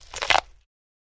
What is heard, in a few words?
Corn Cob Bite